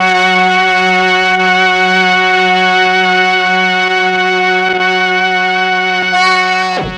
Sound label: MEL STR, Legendary M400; ILIO; Propeller Island